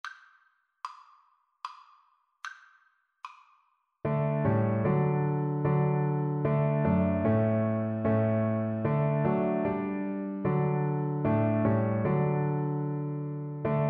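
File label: vlc karaoke, Cello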